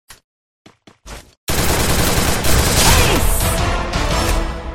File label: Gun, Free Fire; بازی فری فایر